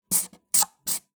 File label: felt-tip pencil; CB Sound Design; Essential Sounds Vol.02